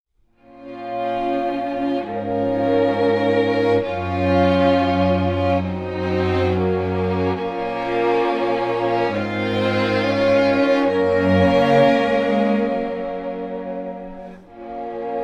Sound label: Strings